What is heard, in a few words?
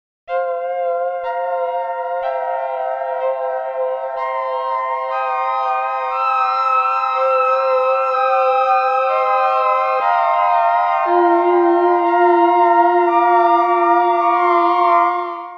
OP-1 Pulse